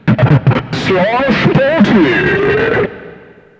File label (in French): JINGLE; slachPARTY